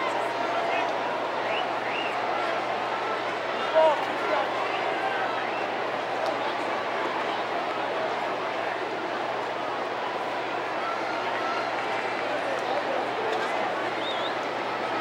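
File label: baseball crowd ambience loop; SFX Kit; Crowds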